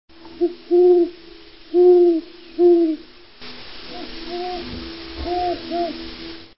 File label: great horned owl songs; Bird